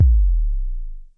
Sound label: low bass long, Roland SH09, drum machines